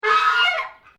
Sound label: Bird Goose Call, Japanese Geese; Geese (Sound Effects:Animals)